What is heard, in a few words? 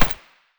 scar silencer mono distant